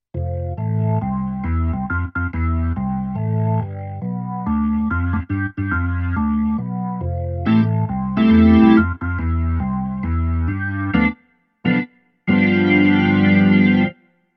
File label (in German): Orgel; Roland FP-60